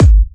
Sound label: Trance Kicks